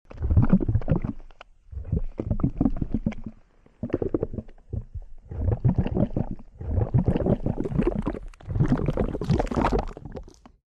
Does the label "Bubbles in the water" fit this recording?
Yes